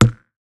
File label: Perc; Sample Banks; Percussion